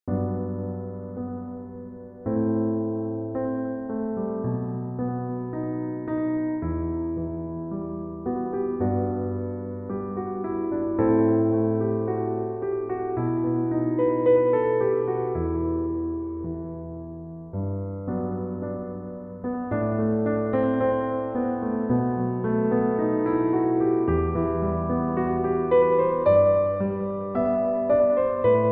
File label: piano